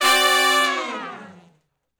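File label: Long Falloff (D) harmony, Horncraft; Sections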